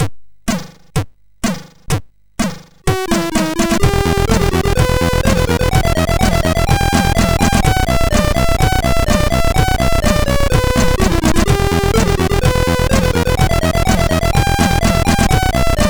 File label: Techno, MOS6581R2; Techno Senfonian